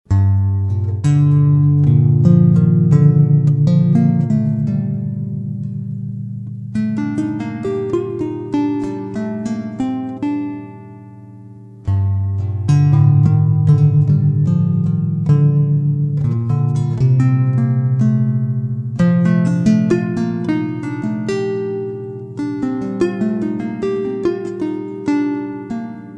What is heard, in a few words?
floating zith pr